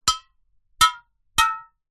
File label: household aerosol can hit blunt object x3; zapsplat